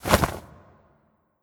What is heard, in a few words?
Skel Scientist Throw